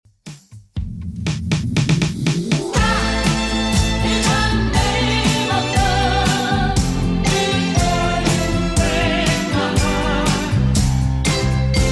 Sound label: 60s 120bpm CLEAN; Redrum